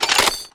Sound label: Gun Reload Chamber Spin, Weapons Foley; SFX Kit